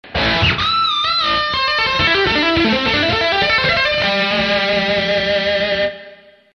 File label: Lick